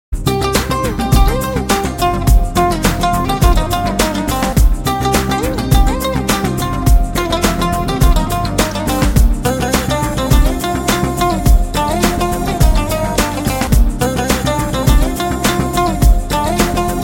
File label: guitar